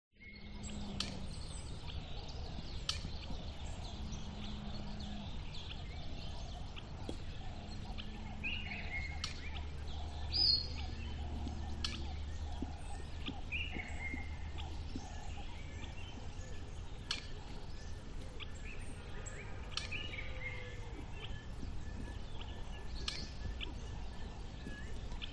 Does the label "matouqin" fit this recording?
No